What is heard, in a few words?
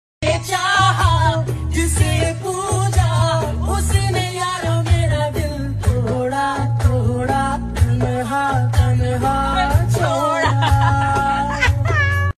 singing; audio meme